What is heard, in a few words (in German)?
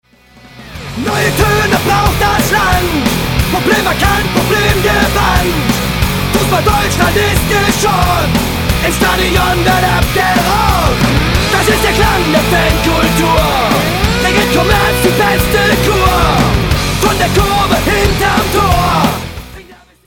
fussball rocknroll